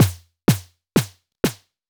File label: synth drums - snare shots; dsk synthdrums